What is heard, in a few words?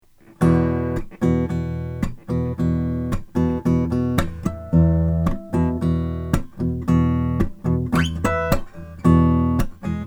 gibson j 45 sunburst